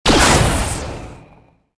alt fire; weapons disruptor

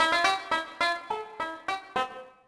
banjo; Splashdown; XBOX; Soultree

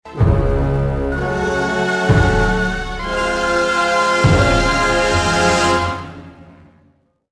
tunnels, music; Star Wars Jedi Knight I Jedi Academy; XBOX

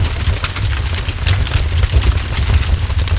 big fire; Soldier Of Fortune II Double Helix; XBOX; ambience

